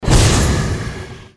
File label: spell dragon shouting; fighter